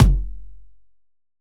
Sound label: kick lofi; kicks